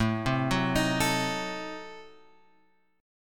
A Diminished 7th A, chord shape; Adim7 Chord {5 3 4 X 4 5} arpeggiated

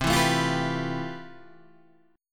F Major Sharp 11th C

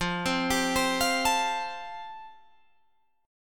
F Major Add 9th, Guitar Drop D

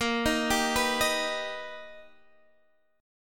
B Augmented Major 7th A sharp

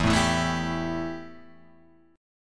E Minor Double Flat 5th E Guitar Open G

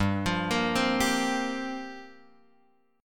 G Minor 11th, chord, Guitar Open F; Gm11 arpeggiated; JGuitar

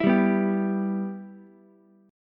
E-Minor, Ukulele; strummed; JGuitar

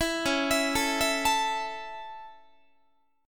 Db Minor Sharp 5th Db down Guitar; arpeggiated; Dbm#5; JGuitar

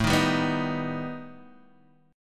B Augmented 7th A

